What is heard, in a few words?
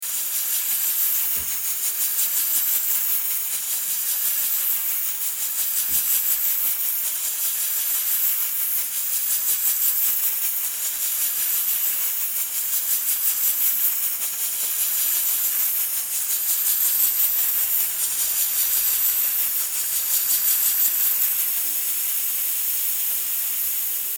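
skorovarka, pressure cooker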